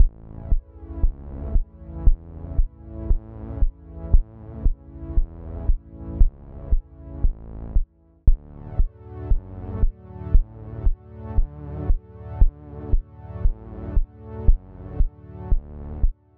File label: sidechain pump bass